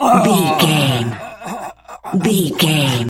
voice male b death low

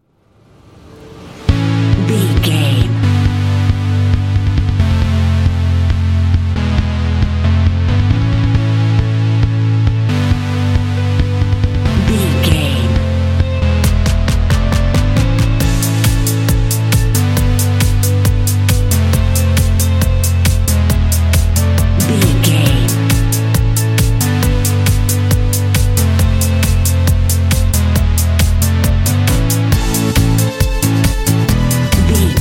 electro club

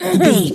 voice female cough interrupt

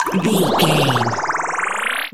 water bubble spell heal

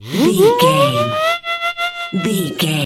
comedy siren whistle fun sounds

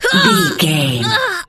voice female death

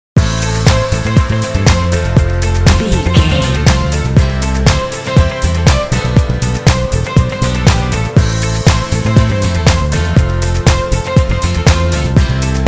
inspirational corporate pop

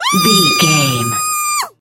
voice female scream

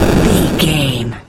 retro explosion general

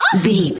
voice female hurt pain high

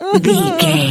voice female cry

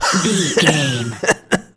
voice male coughing

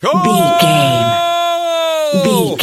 voice male c battle shout

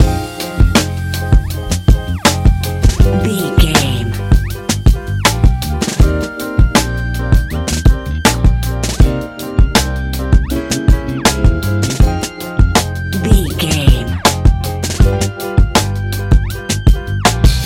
A relaxed and chilling piece of music

